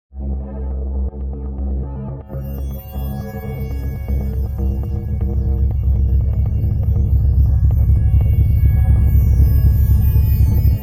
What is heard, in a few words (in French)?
Électro Inquiétant